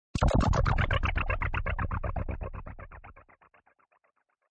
Creepy Critter, Weird dark vibrating alien type sound.; Strange Tones; Sound Design